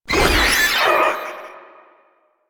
Proto Infested Maggot Attack, Enemies, Vocals; Warframe

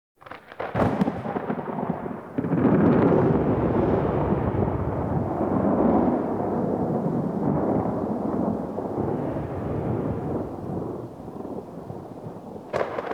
Exterior Rolling Thunder

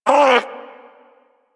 Armadillo Vocal Pain; Warframe; Hunting Critters

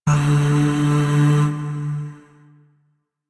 Void Shawzin Oct One D; Warframe; Zariman

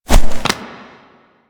Push Foley; Glyph Game Drifter; Warframe; Duviri; Glyph Puzzle

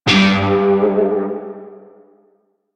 Shawzin Flourish Oct One G; Music; Warframe; Narmer Shawzin

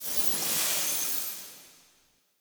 Projector Fade; Tau; Somatic Bearer

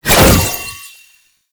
Summon Rune Spawn; Warframe; Uriel; Vythelas

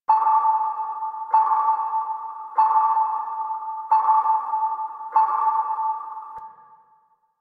Rail Jack Mine Active Loop; Hazards; Warframe

